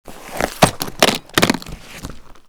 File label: case bomb raise